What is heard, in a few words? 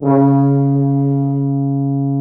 Orchestral Brass